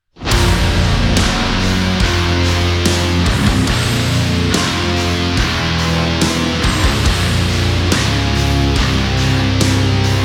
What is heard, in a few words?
higain cabs